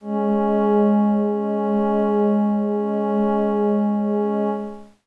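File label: Glass Howl Kawaii C sharp 6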